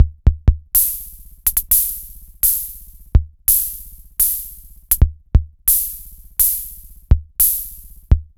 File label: Kay DRM10; m8